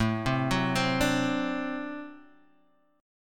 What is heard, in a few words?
D 7th, A